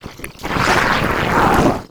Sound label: imp sight, monsters; DoomRTX; imp5